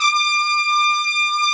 BRS TPTS, Brass Sections; Roland LCDP06 Brass Sections; Tpts Sec.1

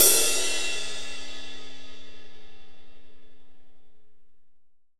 18 AMB RI, AMBIENCE RIDE CYMBAL; AKAI S6000 CD-ROM - Volume 3

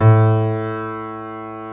A1 PNO.FOR, G.PIANO; AKAI S-Series CD-ROM Sound Library VOL-7

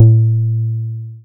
BAS SH-101; Best Service Gigapack 2

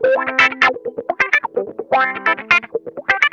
WASPISH, Funk Guitar; Zero G - Funk Guitar

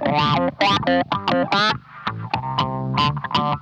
DIRTY OCT, Funk Guitar; Zero G - Funk Guitar

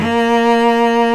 SOLO CELLO

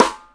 Ping Snare; EMU Planet-Phatt

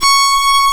C#, strings; Big Fish Audio

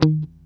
D#3, funky guitar

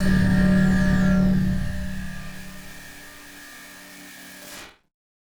Battered Synth; malfunctioning synth samples

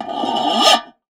Raw, contact mic, Tin Of Guitar Strings; musicradar; contact mic madness

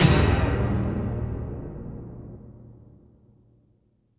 Percussive Stab

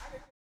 STOMPS; Spectrasonics; Vocal Planet; Blues Soul

